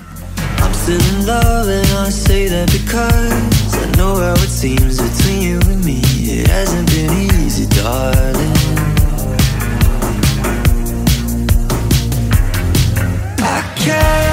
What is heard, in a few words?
English Ringtone